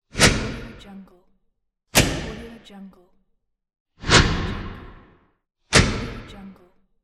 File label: stadium spotlight